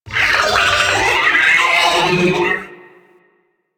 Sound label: Sentient Ghost Caster Pain, Barks; Warframe; DE; Sentients; Sentient Eidolon Ghost Caster